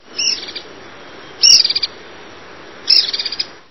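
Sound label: attention-grabbing sound of flycatcher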